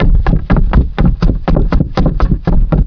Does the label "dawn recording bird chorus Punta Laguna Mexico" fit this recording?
No